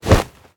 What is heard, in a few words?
fire; smite; chiron